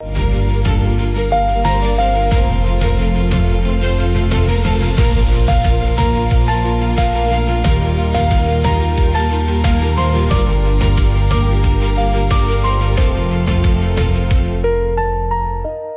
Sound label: loop; bgm; education